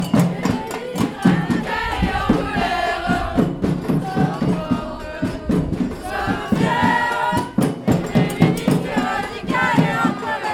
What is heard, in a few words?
batucada